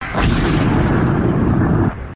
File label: Torpedo Launch